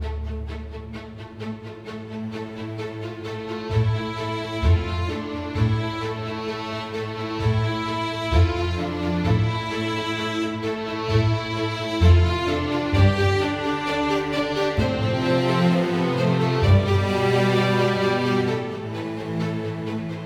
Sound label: Music Rush Bowed; 7 Mages